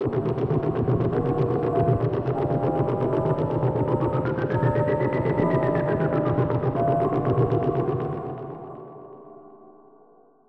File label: Dark Synth, cinematic drama soundbeds; musicradar